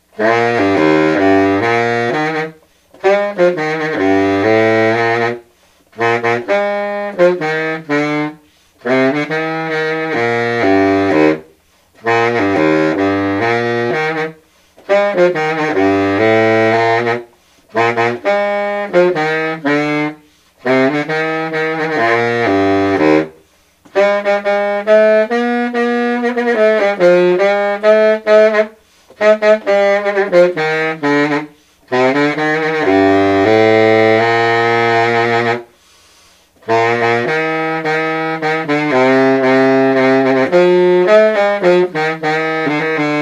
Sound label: Martin Silver Bari B flat Key